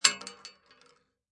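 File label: Bullet Shell Drop Shotgun 12 Gauge Metal; Bullet shell drop, Shotgun: empty Shotgun 12 Gauge shell falls on metal.; Impact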